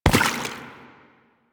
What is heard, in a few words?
Step Water, Footsteps; Lasrian Butcher; Lasrian Soldiers; Foley